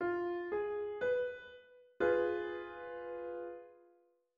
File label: triad dim F4